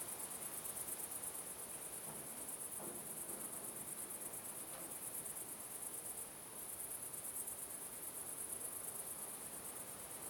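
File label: Ambience Artist Grasshoppers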